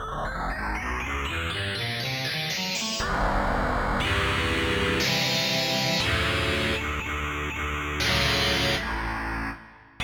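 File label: Mondo Guitar, fuzz guitars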